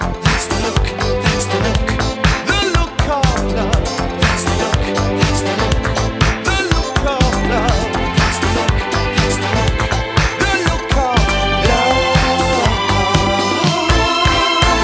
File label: ring tone